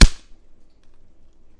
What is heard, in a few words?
snare ish lighter distortion, Cigarette Lighter; UNUSUAL KITS and FX